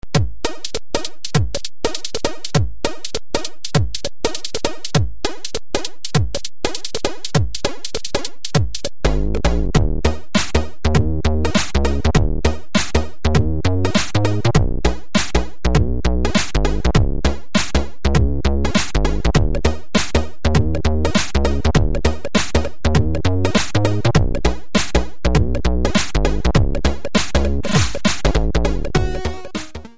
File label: sid 8580